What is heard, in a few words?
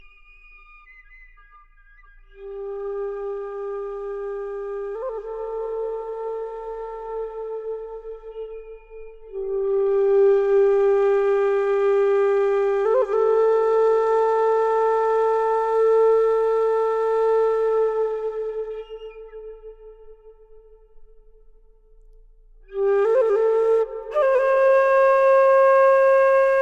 Tibetan Meditation Music